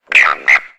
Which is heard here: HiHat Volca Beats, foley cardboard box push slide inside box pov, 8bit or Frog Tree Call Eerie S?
Frog Tree Call Eerie S